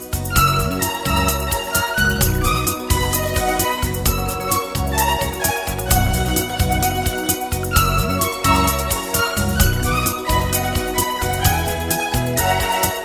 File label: Modern Bachata